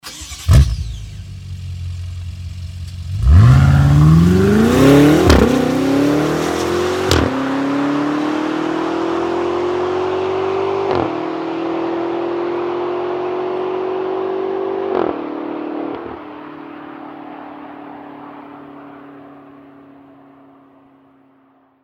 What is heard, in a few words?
BMW M V8 Starting and pulling away; x5m; 2014